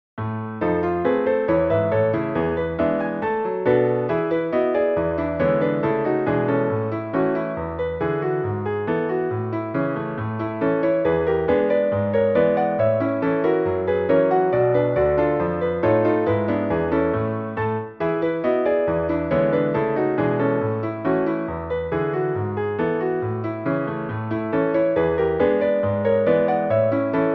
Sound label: piano pseudo rag a major; ragtime-like piano solos